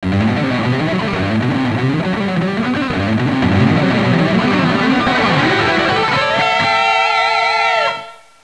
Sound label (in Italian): suonerie musica rock